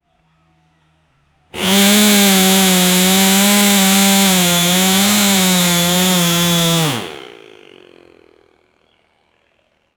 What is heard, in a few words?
the sound of a stihl, chainsaw